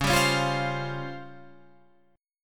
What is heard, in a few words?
C sharp Major 7th Flat 5th, chord